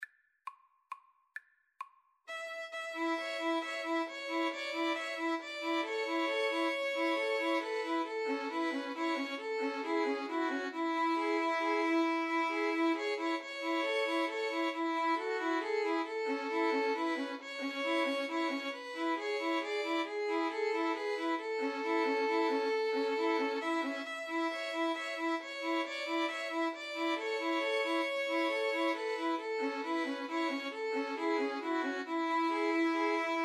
String trio

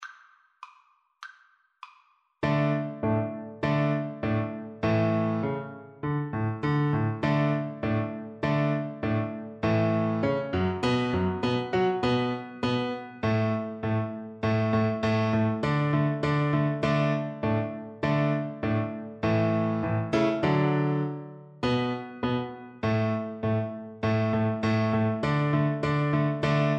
Beethoven Turkish March Ruins Of Athens, cello; Op113

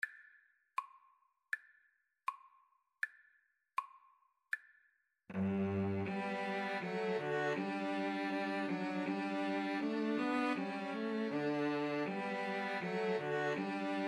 blacksmith, 2 Violins Cello; Handel, George Frideric - Harmonius Blacksmith

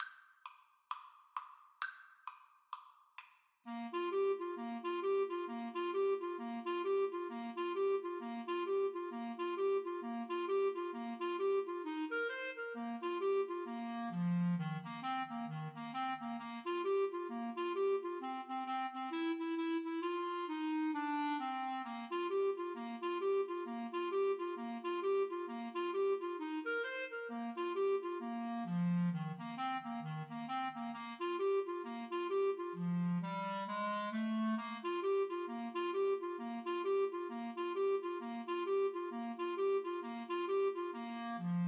Clarinet Duet

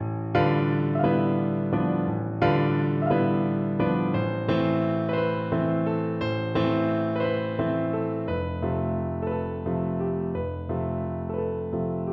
songs my mother taught, french horn

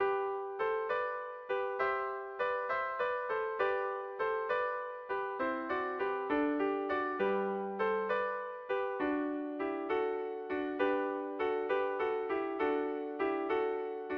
pastorale, violin trio

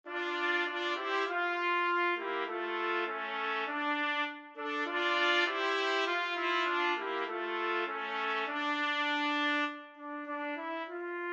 crown roses, trumpet trio

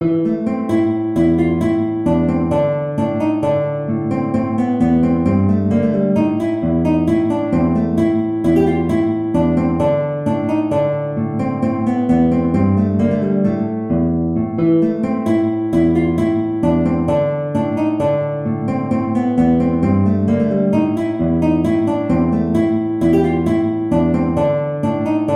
Freylachs in Am guitar

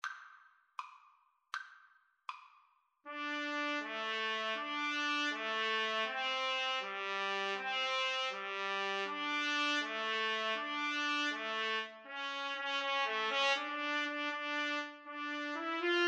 brian boru trumpet duet

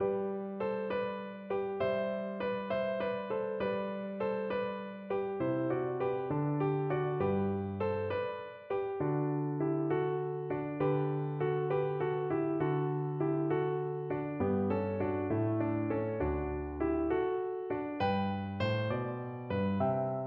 pastorale, piano

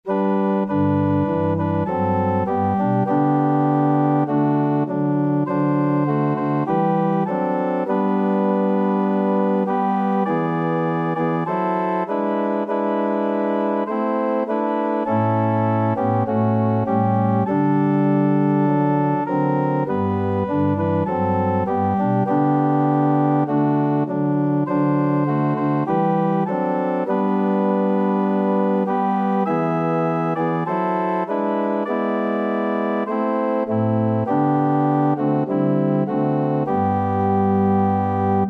organ

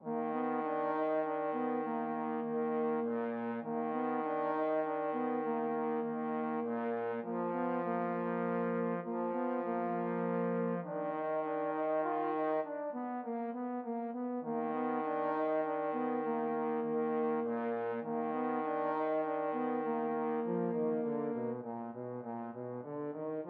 trombone duet

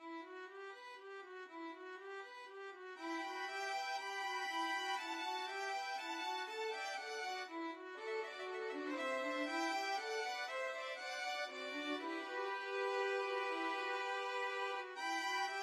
Spanish Dances violin trio; Grandos NO2

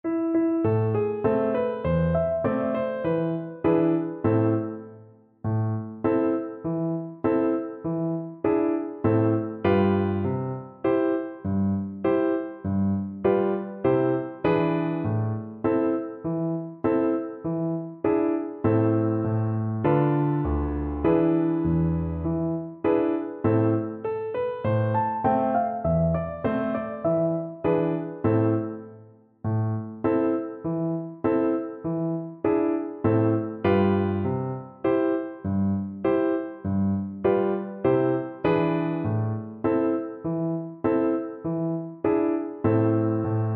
Recorder

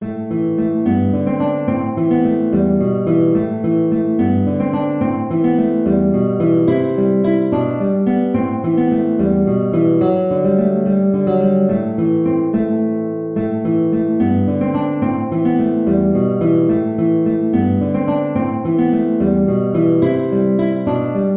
guitar; Jenny Pluck Pears

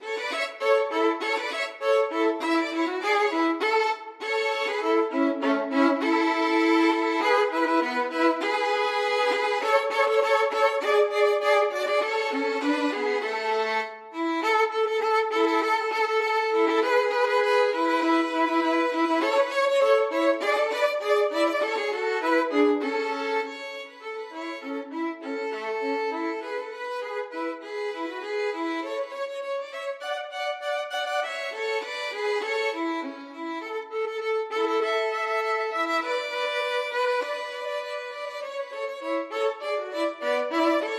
grand old duke violin duet

